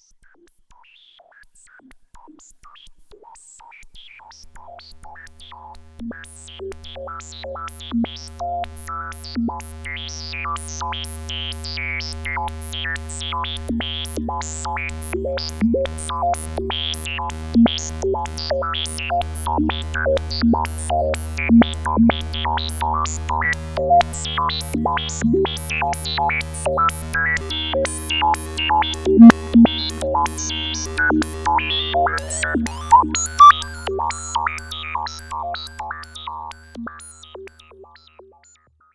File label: jx8p random